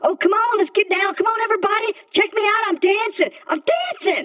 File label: Live Claptrap